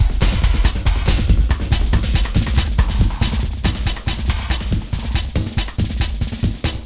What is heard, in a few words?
Wild Ride 140